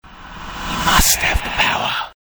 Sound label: whisper